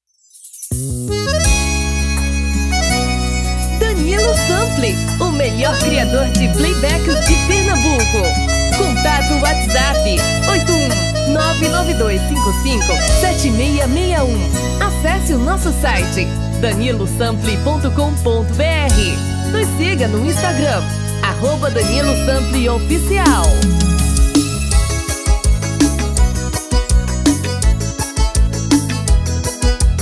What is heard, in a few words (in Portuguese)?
Retro